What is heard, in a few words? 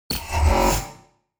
cloak sound